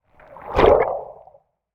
Underwater Pass By, Water, Underwater, Movement, Pass, By, Hydrophone; Water Sound Effects; BlastWave